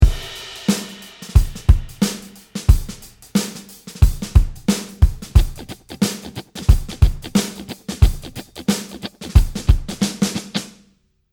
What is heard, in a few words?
shuffle, guitar